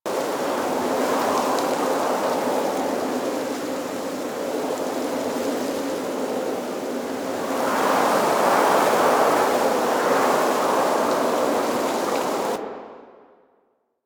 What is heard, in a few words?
Tree Wind Loop Angry, Ambience; Warframe; Duviri